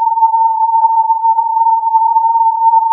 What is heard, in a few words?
filtered input